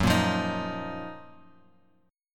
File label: F sharp Major 13th Bass Standard; strummed; JGuitar